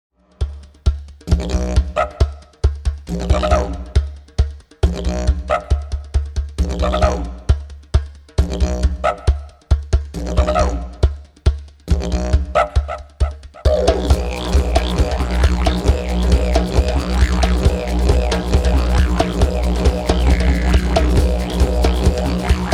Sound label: Energetic Tribal Music